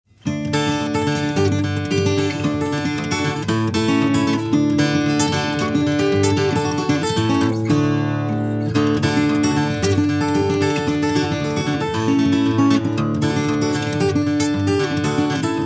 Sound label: Banjo Guitar